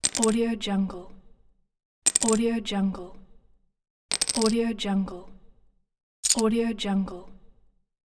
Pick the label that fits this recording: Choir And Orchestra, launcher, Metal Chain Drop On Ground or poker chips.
poker chips